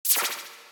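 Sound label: trident attack windup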